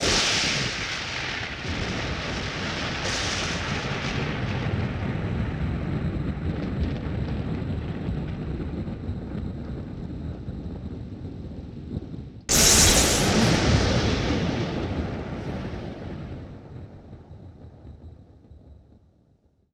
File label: Lightning Strikes Multiple Effected; Sony Pictures Sound Effects Series Volumes 1-10; Sony Creative Software